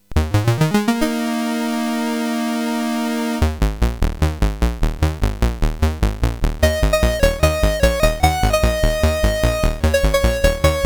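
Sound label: Pocket Rockets, MOS 8580 SID chip music, games; CSG8580R5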